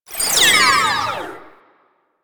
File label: Conduit Proj By; Sentient